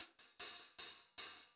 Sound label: POP HH, Pop-Rock Drumloops; 152 POP